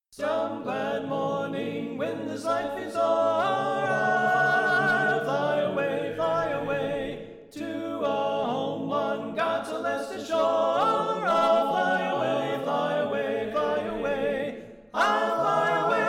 SATB Alto Left

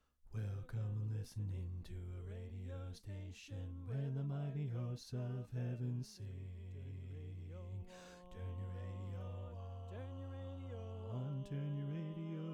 Bass Left; Pennsylvania Groups; NBC

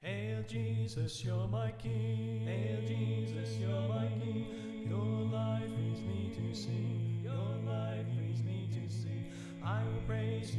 Reverb

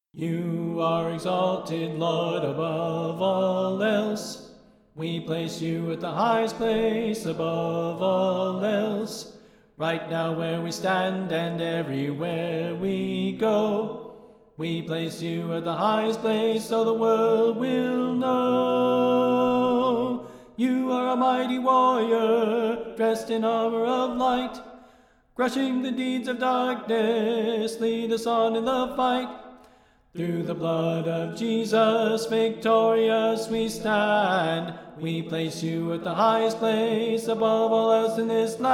Soprano Left

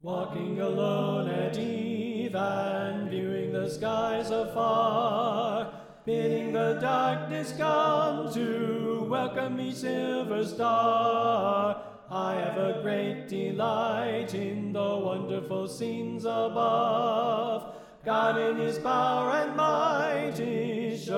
Tenor Left

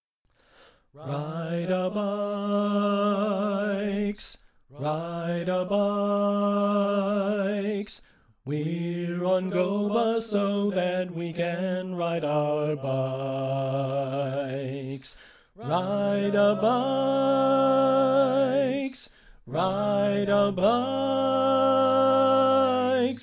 Lead Left

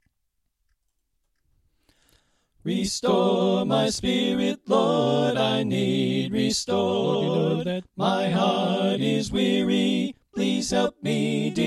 Soprano Right

